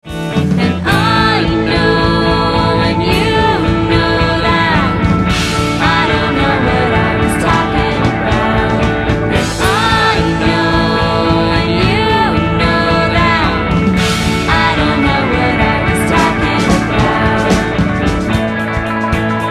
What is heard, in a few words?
drum